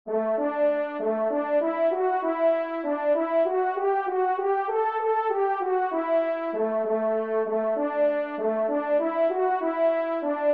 Fanfare Do Maj TRP; 1e Fanfare; Corrette

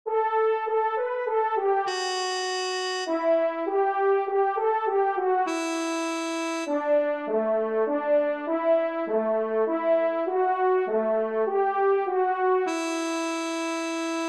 Menuet Do Maj TRP; Corrette

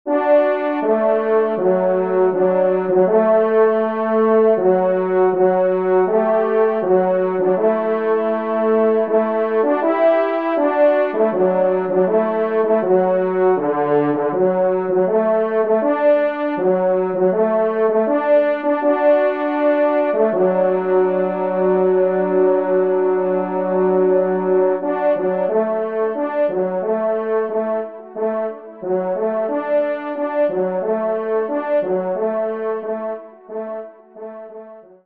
polka, trompe; Polka des joyeux piqueurs

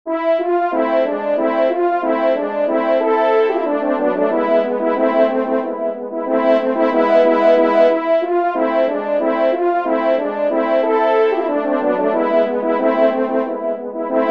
Trio Cors

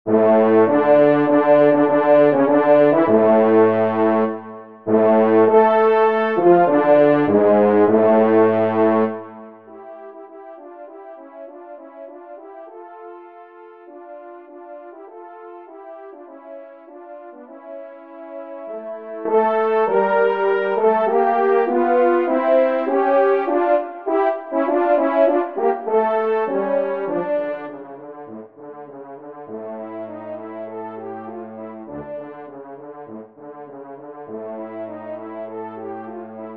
DUO Original La-b Maj; Kling; duo cors n 25

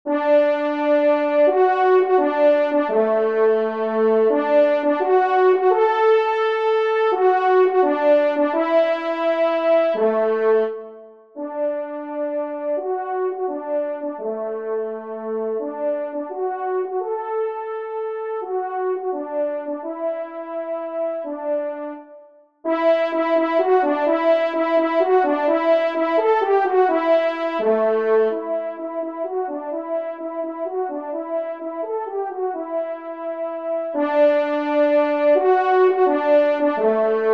trompe; Echos de Picardie; Courtade; 1e Trompe

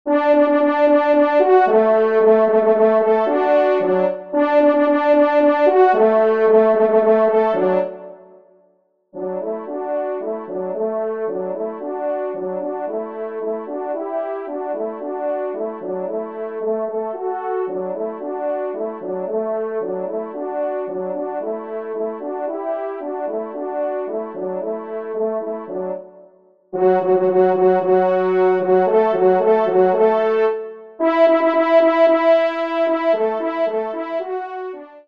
trompe; 2e Trompe; Gais Sonneurs; Victor Viney

